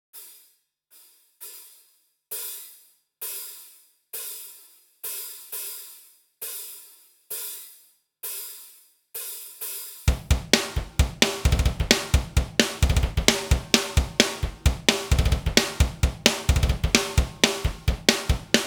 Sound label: Riff, Drums; ezdrummer